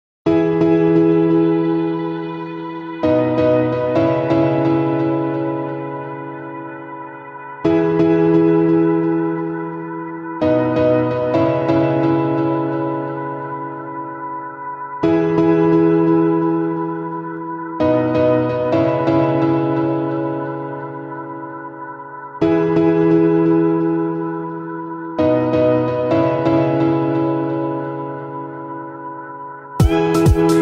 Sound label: Electro Chill Mix; Geometry Dash